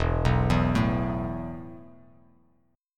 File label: E Suspended 4th E, down